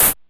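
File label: Q Open Hat, DIGITAL KIT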